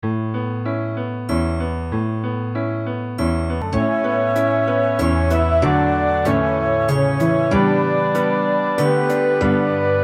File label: relaxing